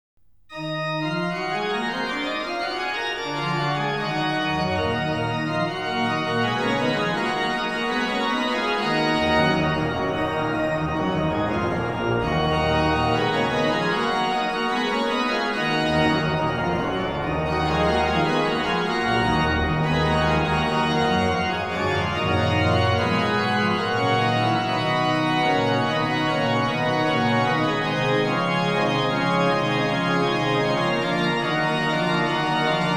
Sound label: organ; buxtehude